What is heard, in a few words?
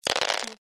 roll